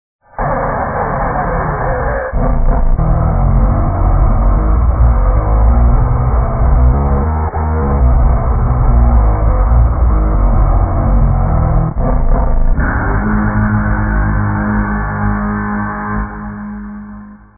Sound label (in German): klavier